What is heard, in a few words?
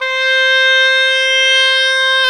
OBOE, Orchestral Winds, Oboe mf f